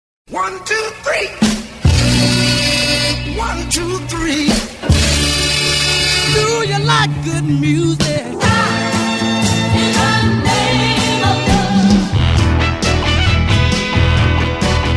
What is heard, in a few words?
Motown